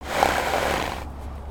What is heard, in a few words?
horse snort